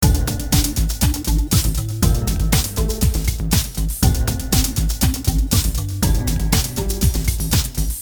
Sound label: depressing rock music